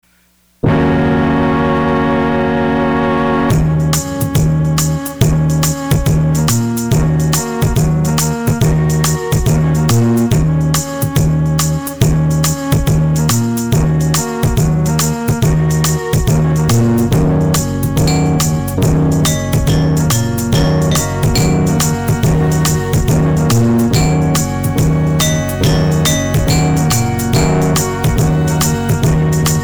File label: country electronica; try kicking the habitat habit